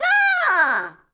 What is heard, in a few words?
Poke Tail; talking tom cat 2 android ios online gamerip 2011